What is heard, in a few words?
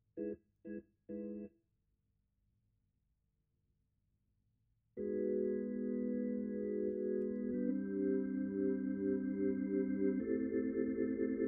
Hammond